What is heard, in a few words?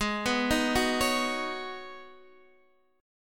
Ab Minor 11th, Guitar, Open D